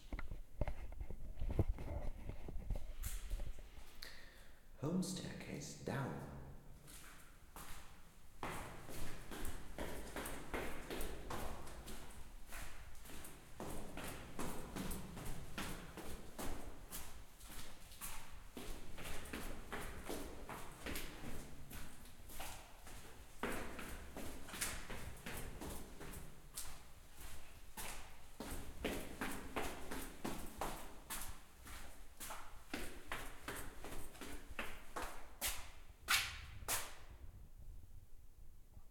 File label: small staircase down; Going down a six flat appartment house, two flats to each storey. Tiled floor, plastered walls and big windows to the front.